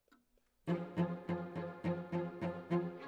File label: Cello Solo